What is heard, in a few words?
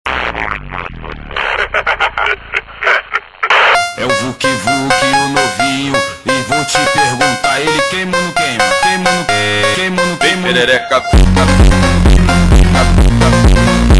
funk